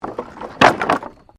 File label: Wood Movement Medium